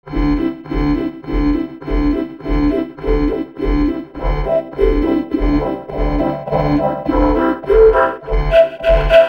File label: rockdj Harmonic